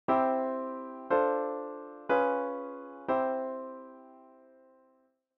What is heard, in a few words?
tritone sub; Progression with a tritone substitution (Db7): C Dm7 Db7 C